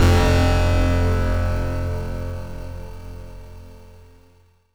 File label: bell hit